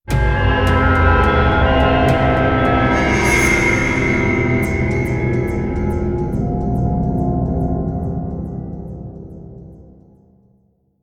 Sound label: UI Stinger Complete; starcraft 2 wings of liberty